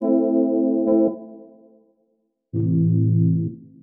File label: DEEPHOUSE ORGAN 125 A